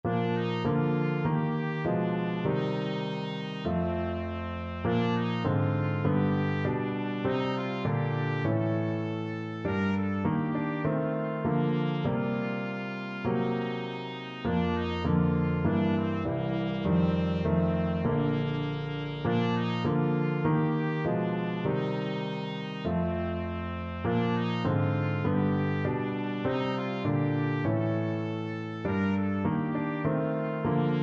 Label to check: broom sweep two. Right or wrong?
Wrong